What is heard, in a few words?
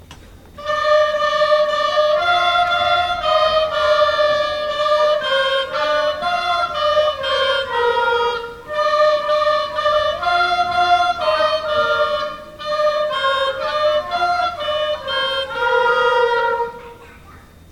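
Flute Oboe Class Feature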